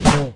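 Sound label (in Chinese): Bear atk, 熊灵布鲁斯攻击音效; 妮塔; 沙漠世界; 荒野乱斗; 熊灵会用爪子攻击对手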